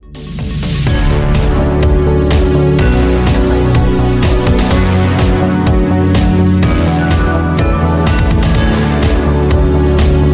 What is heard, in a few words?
music robot; trespasser v2